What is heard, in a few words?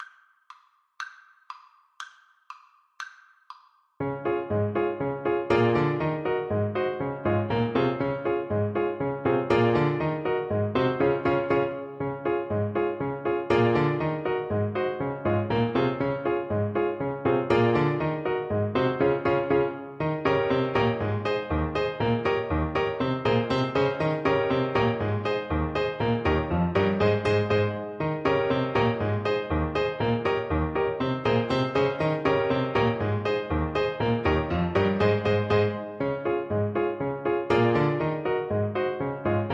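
rheinlander polka alto sax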